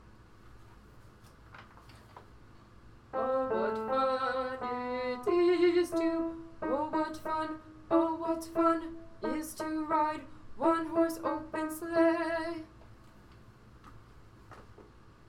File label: alto 1 soprano 2; nutcracker